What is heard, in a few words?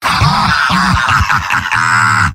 m laugh evil; demoman; tf2; garrysmod; mvm